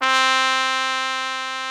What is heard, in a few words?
TRUMPET C3; Greytsounds VOL-1